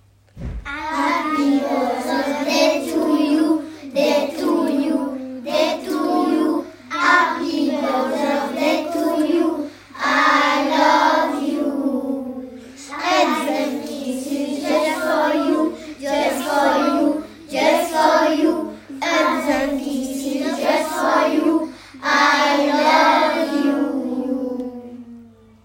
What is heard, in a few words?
happy mothers day classe, ecole maternelle